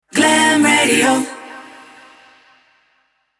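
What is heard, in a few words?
Sung Jingle, C minor 128bpm, Acapella; Glam Radio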